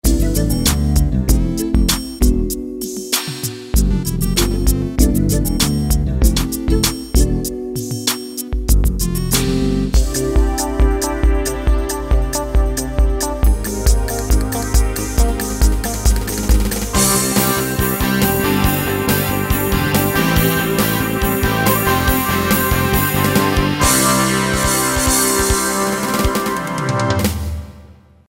Rock & Dance, Roland EM-10

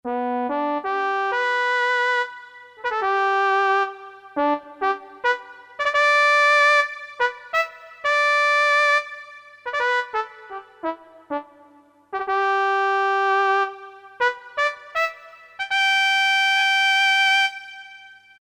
Trumpet, Korg DSS-1, Brass

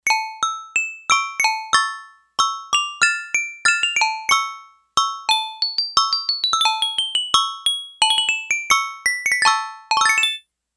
Toy Piano

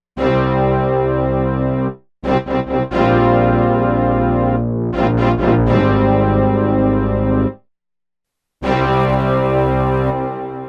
LARGE HALL

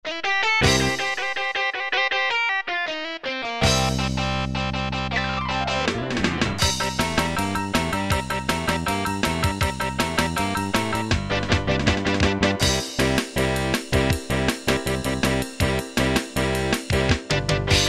Rock'n'Roll; Preset Styles

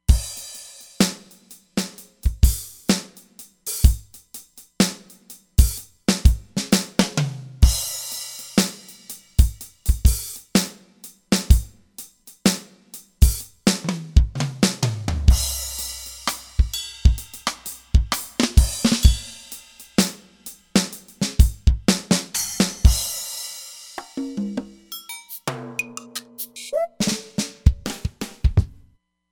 Studio X Kit; Drums

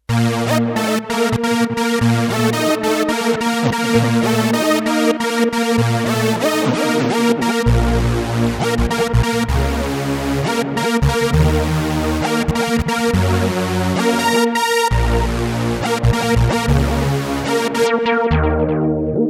2 Much Saw, M-Audio Venom

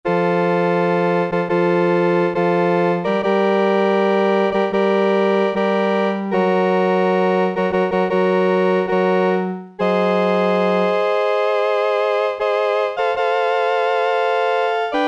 PIPE ORGAN, Yamaha SHS-10

